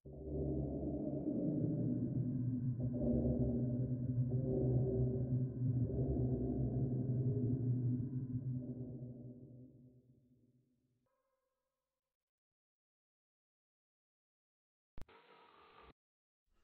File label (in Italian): effettti sonori horror tutti gli effetti sonori horror; del 2021 sounds effects ottima qualita